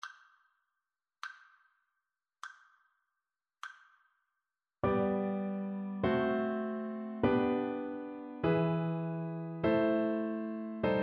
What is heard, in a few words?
harmonic dictation; ear training